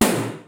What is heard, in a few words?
FUNK, DRUMS; Kid Nepro; Elite